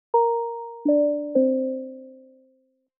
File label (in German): Dreiklang